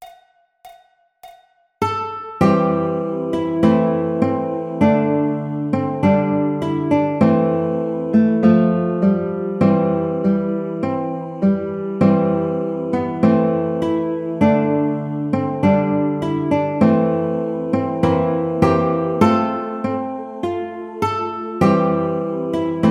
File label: Auld Lang Syne Baritone, ukulele